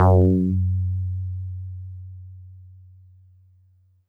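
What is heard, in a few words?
Mono Bass DX100 F#6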